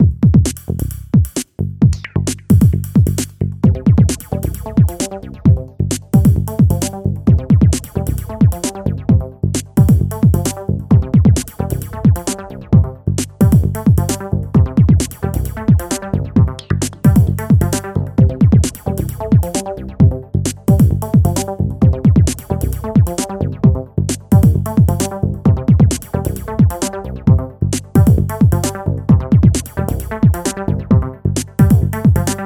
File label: Boombox Beats